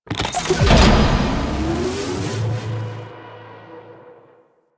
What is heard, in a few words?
Antenna Activate; Missions; Venus; Orb Heist; Bounty Three